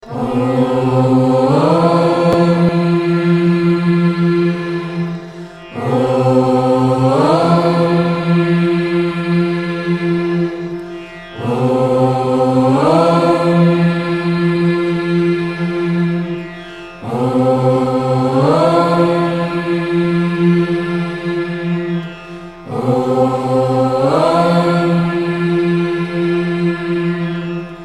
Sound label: om shanti mantra